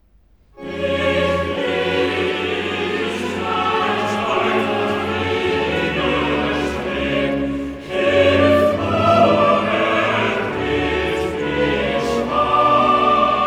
Choral; BWV 177; Ich ruf zu dir, Herr Jesu Christ; Ich lieg im Streit und widerstreb

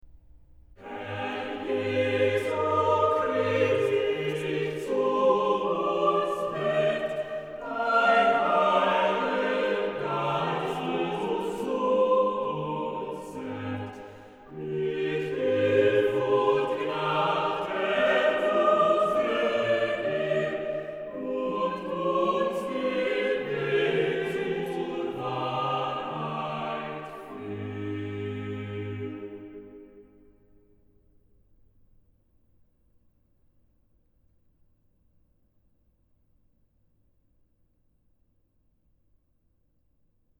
Chorale, ORGAN; BWV0443; Herr Jesu Christ, dich zu uns wend; BACH; BACH ORGAN WORKS; CHORALE PRELUDES

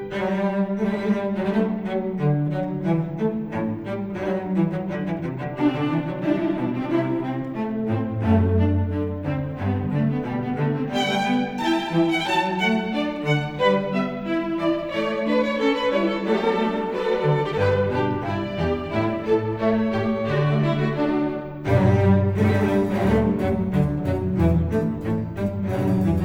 Variation 10 Fughetta, strings; Bach; Goldberg Variations; Sitkovetsky; NES Orch

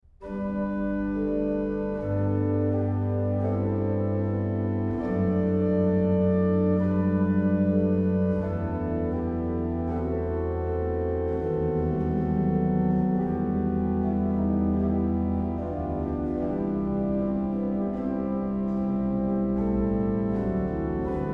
ORGAN, Chorale Preludes; Liebster Jesu, wir sind hier; BACH; BACH ORGAN WORKS

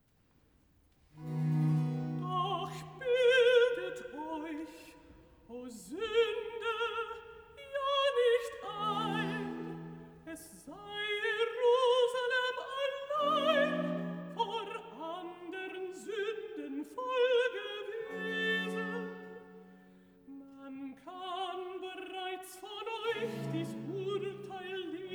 Recitativo Alt; Bach; Cantatas; BWV046; Schauet doch und sehet, ob irgendein Schmerz sei; Doch bildet euch o Sünder ja nicht ein

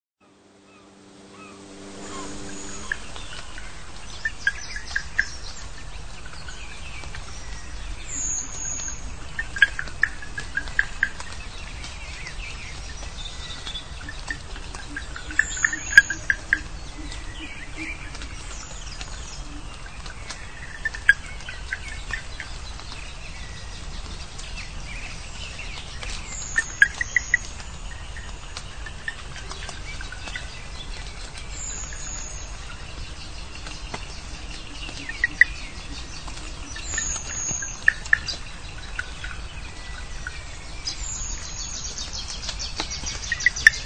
Wood Frogs; ambient; Gordon Hempton

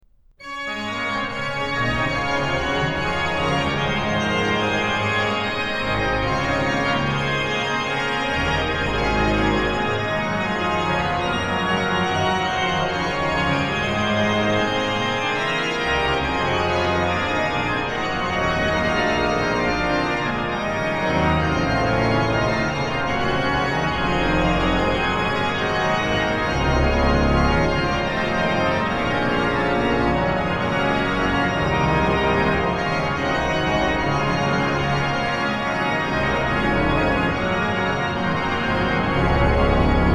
ORGAN, Chorale Preludes; BWV0628; Erstanden ist der heilge Christ; BACH; BACH ORGAN WORKS

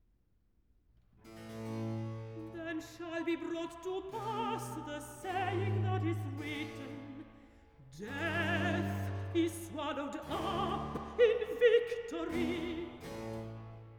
Recitative Then shall be brought to pass; Handel; Messiah

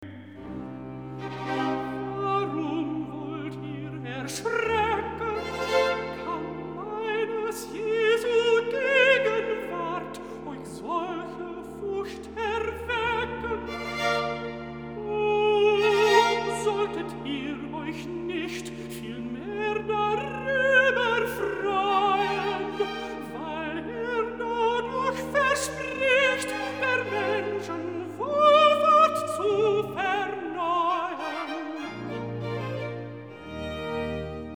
Recitativo (Alto); Warum wollt ihr erschrecken; BACH; Christmas Oratorio

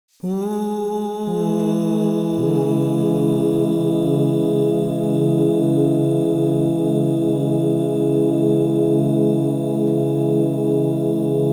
Do-diez minor, crystal bowls; Yoga and sound

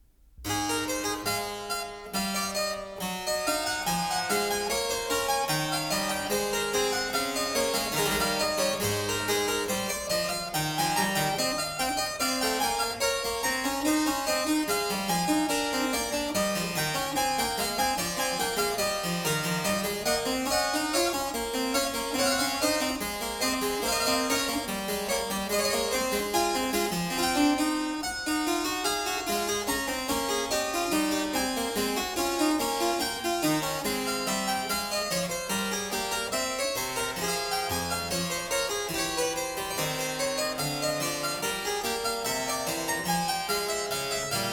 Prelude; Prelude 19; BWV 864; BACH; WELL-TEMPERED CLAVIER; WTC 1

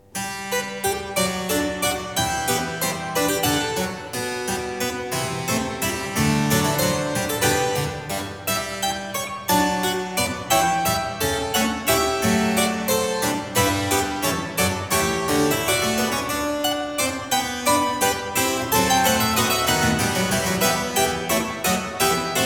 Variatio 4 a 1 Clav.; BACH; GOLDBERG VARIATIONS

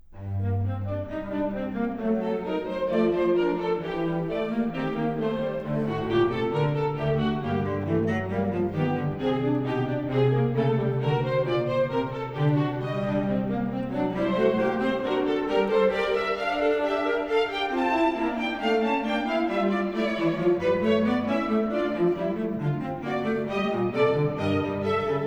Variation 21 Canone alla Settima, strings; Bach; Goldberg Variations; Sitkovetsky; NES Orch

